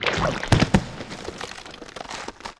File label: Crab Die; Seer; GamigoNA